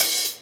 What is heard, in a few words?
Hihat Open Close Acoustic